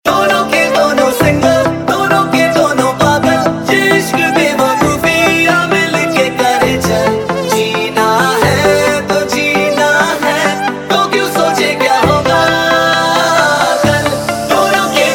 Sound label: Chill Trap Remix